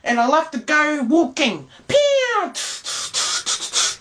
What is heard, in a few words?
walking; francis